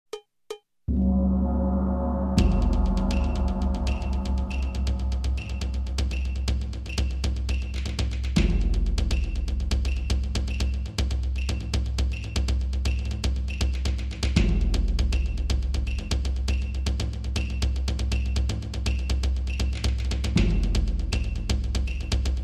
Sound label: Japanese Loop